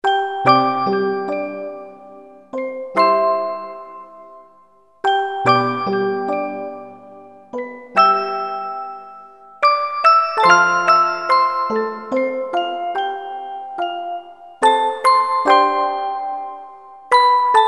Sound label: musicbox